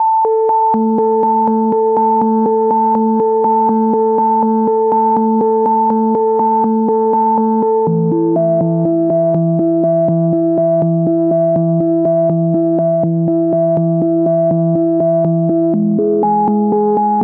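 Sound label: 122 BPM Melodic Arps G major E minor Tonic G; MMB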